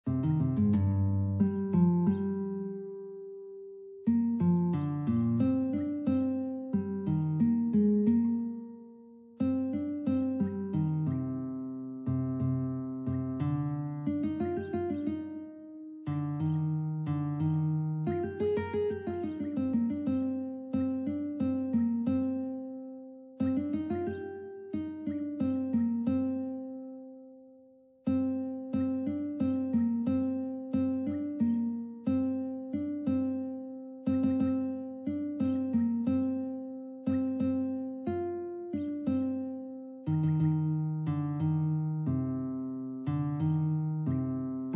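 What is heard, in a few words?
guitar; Toei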